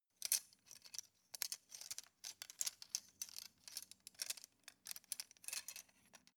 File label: cutlery plastic multiple rattle